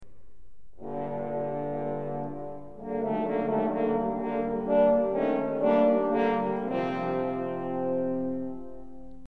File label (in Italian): corni; pierino lupo